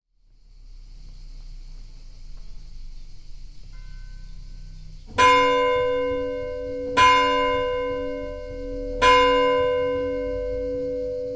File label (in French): Eglise Midi; Saint-Siffret